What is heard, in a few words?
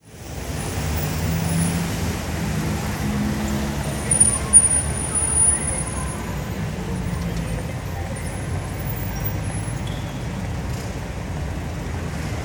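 Hong Kong City ambience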